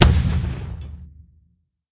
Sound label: Bomb; hero in the ocean 2